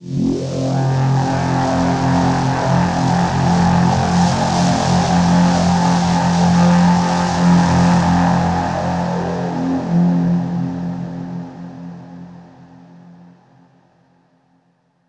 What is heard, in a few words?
Overlay Drone; Act2 Rezoning